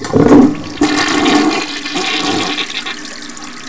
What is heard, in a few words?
toilet